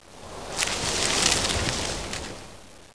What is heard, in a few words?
vines farm, games Caesar III